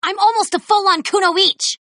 win; april; Power Rangers vs Teenage Mutant Ninja Turtles - Ultimate Hero Clash 2

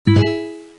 click; Ball Physic Game

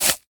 clean slice; Fruit Slicer

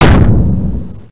hit; Sling Birds

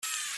arrow frame unpop; Looney Tunes - Avalanche Snowboarding

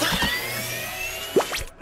button press; Cloudy with a Chance of Meatballs - It's Raining Man

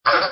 female scream; Dark Village

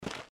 step; Keep Out! A Dungeon Adventure for the Brave

